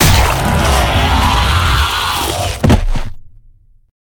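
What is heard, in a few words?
real undead golem boss death, monster; thedead3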